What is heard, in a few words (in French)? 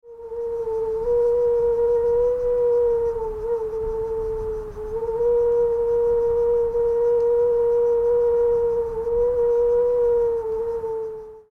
plastorgue aigu